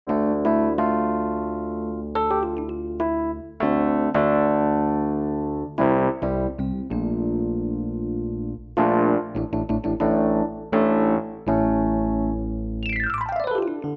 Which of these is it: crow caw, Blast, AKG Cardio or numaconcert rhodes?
numaconcert rhodes